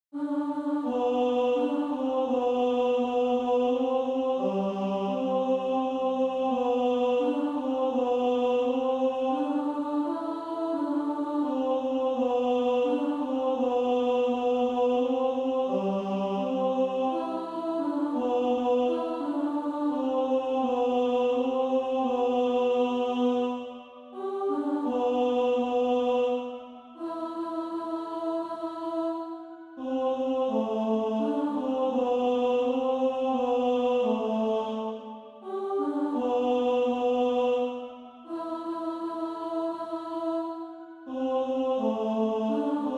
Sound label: Female Choir